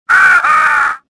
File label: death, swamp; swamp2; Star Wars Jedi Knight II Jedi Outcast; XBOX